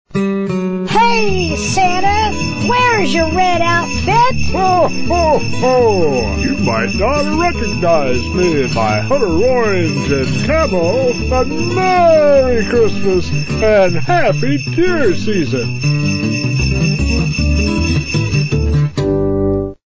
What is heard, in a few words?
field theme christmas deer season